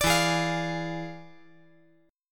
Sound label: E 7th Flat 9th, chord